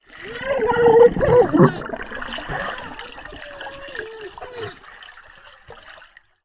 human voice boy 8 years old underwater shout close up bubbles